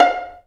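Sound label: Vio pizzicato F4; Digital Sound Factory Virtuoso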